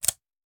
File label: Machine Gun Foley; Sound Ideas; Guns